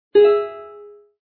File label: short strum; butterfly island adventure